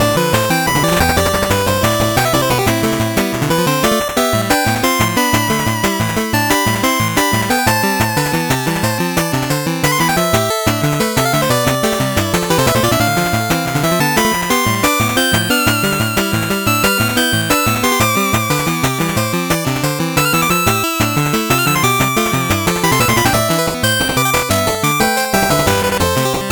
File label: 8bit bgm normal